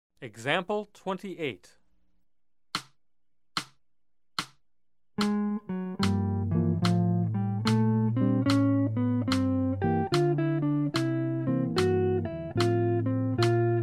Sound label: Jazz; jue shi ji ta